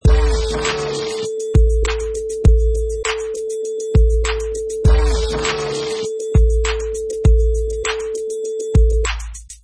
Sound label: Vinyl Scratch Slide 100 bpm with beat